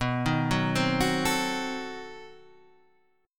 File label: B 7th Flat 9th B, guitar chord; B7b9 Chord {7 6 4 5 7 5} arpeggiated